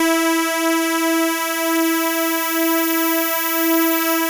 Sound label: SPLIT SWP E5